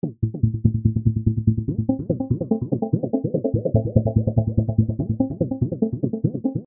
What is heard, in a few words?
Arp Bass E